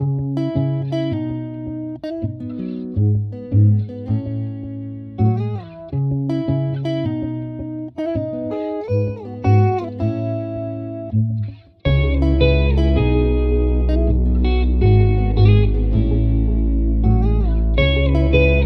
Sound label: 162 BPM E Maj, Guitar; GPS; Cymatics; Miami Guitar Pack - Pt 1